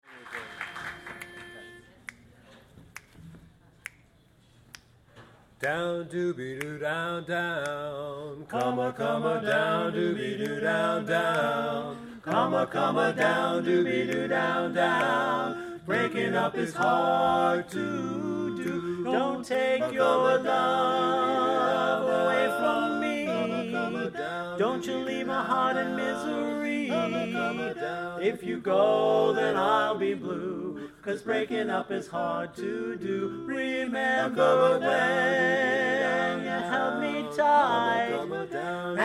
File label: Barbershop Quartet; San Francisco Barbershop Quartet